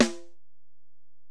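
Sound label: Acoustic Snare, Alesis DM-PRO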